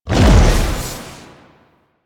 Howl Jump; Warframe; Voruna

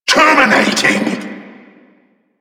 attack vocal; Warframe; Duviri; Dax; Vocal Set B

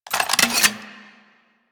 Rifle Primary Switch; Warframe; Weapons; Wisp Rifle

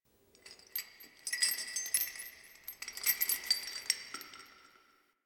Sentient Throne Room Chain; The New War; Ballas

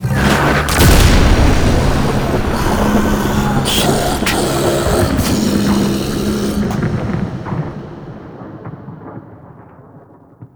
Murmur Exterior Thunder Clap F; Warframe; Ambience; Duviri

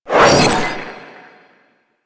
Glass Shank Cast; DE; Warframe

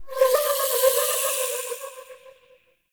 Shroom Proj Whiz By; Warframe; Nokko; Gyromitra

